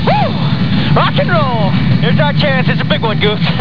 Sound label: rock; Top Gun